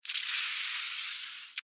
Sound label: Alien Spider Web, Scifi, Alien, Spider, Web, Shoot, Insect, Science, Fiction